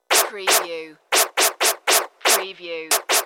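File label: DJ Record Scratch Effect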